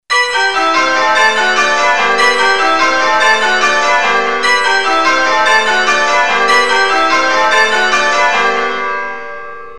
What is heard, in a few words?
Queens, changeringing